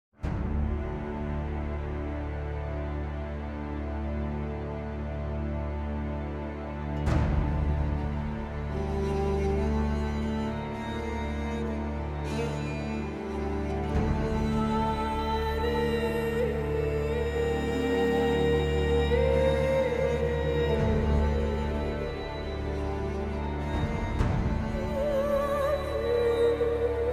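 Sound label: Music Cue Combat Stinger; Crusader Kings III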